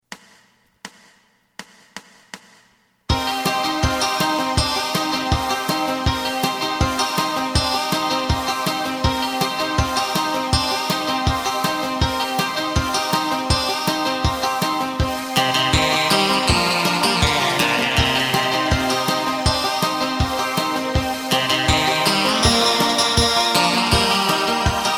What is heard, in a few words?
bass